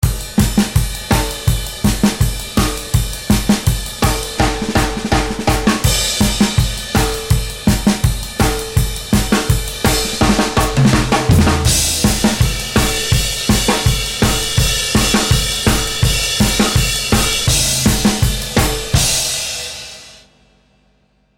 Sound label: drums only; BIAB